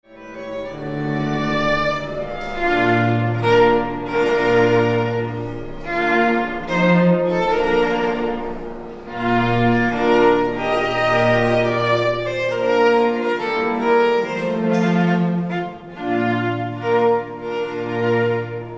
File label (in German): Quartet Live